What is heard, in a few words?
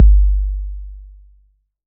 808 bass drum tape; Goldbaby Tape808 & Tape909; The Tape 808